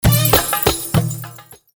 machine

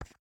button Hover; jumanji

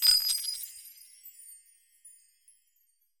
Diamond Spawn

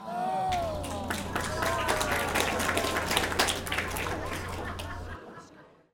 crowd Reaction guild small Reveal; spellbound

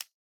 tick; spellbound

